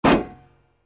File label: 57mm Shell